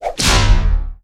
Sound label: Energy whip; polygoniix Intro Essentials pt2; Electronic; Effects